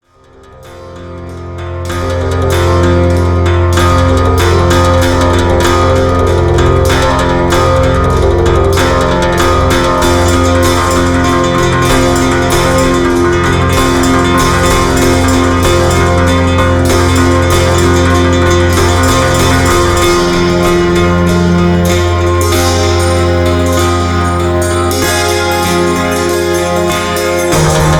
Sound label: Ukrainian Folk; Ukrainian Folk for ''Ten Desires''